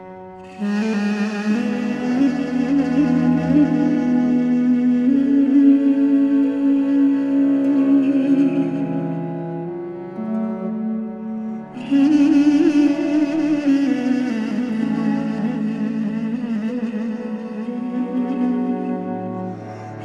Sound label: Duduk mood